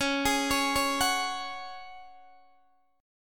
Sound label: Db Suspended 4th, Guitar, Open D